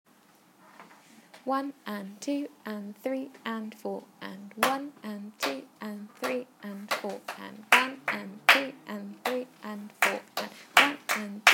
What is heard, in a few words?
rhythm